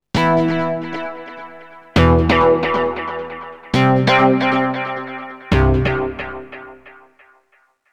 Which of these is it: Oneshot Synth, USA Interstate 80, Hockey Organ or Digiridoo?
Oneshot Synth